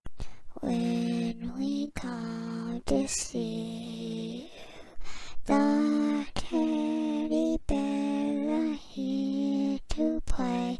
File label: SCARIEST Sound